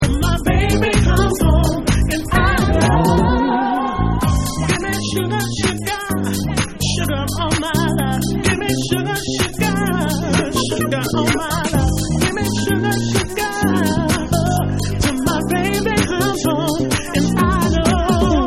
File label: Latin Jazz Mix